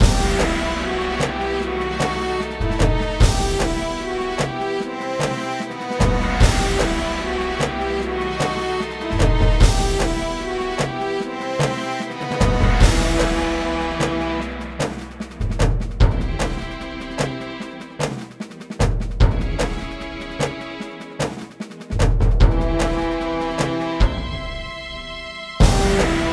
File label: Boss LOOP; Gryphon Knight Epic